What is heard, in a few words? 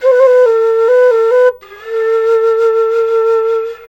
SERULING, Heart of Asia